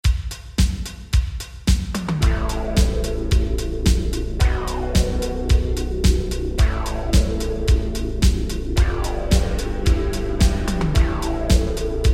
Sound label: synthwavey